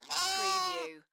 Lamb bleating; beanstalk audio

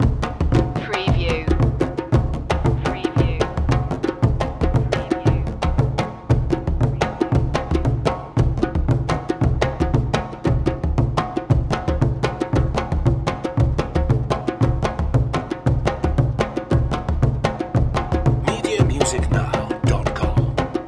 african drums ndengela zalamo dance loop

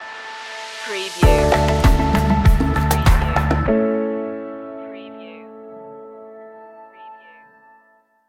natural tendencies stinger

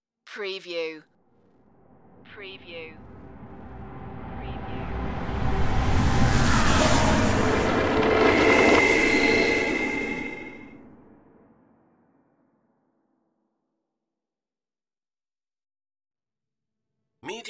power glitch flyby

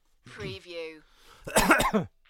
Male Cough; beanstalk audio

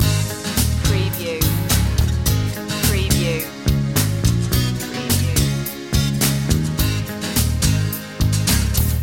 guitar slinger loop

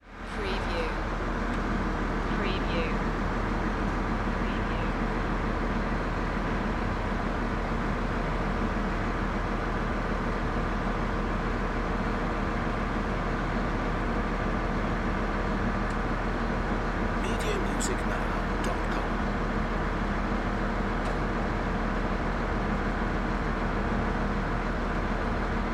Diesel Truck Engine

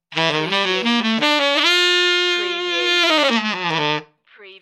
Sax Riff

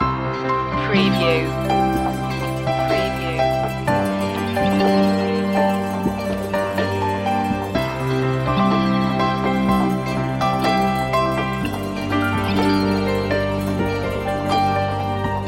so quiet loop

